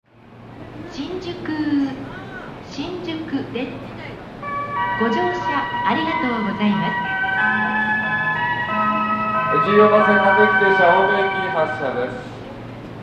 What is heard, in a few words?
shinjuku melody, Tokyo Train Tunes; japan